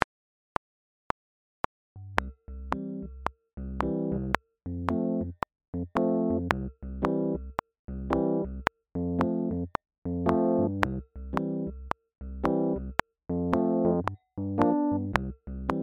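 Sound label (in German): Reggae Begleitung ternär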